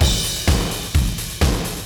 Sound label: drum loop